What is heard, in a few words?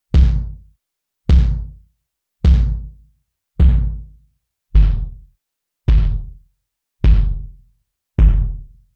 Tired Old Bass Drum Distorted